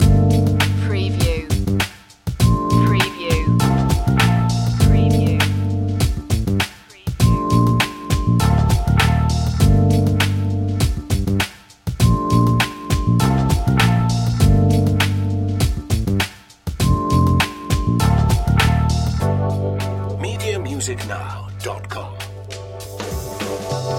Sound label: chilled loop